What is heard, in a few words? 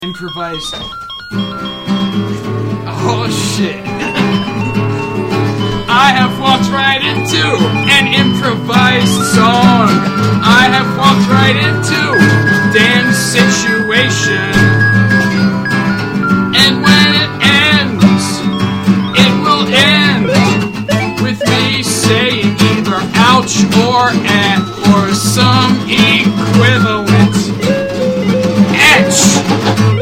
30 second song about an improvised song trap; shortsongs